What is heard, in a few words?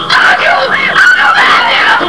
exclamation; War of the worlds; Desktop Themes